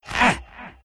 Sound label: pain; dota2; Bane